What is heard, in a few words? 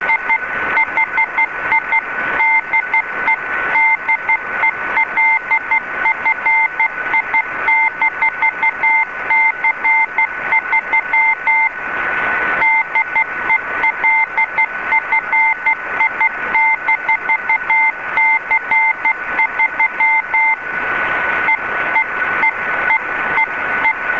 cw